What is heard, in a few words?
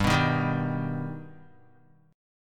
G Augmented G down Guitar; G+ strummed; 4F,4A,5C,5G,5C,6E